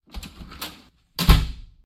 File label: enter exit house